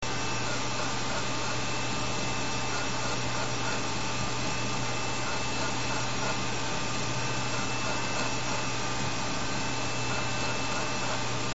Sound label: hdd sound